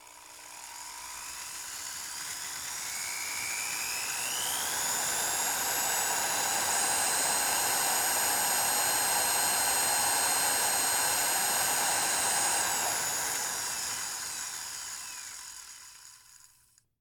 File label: Dentist Drill Run; Medical; Blastwave